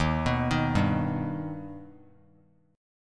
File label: D sharp Augmented D sharp Bass Standard; D#+ arpeggiated; 11,14,13,0; JGuitar